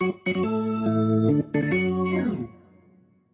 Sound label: Organ; Fourth of July 2019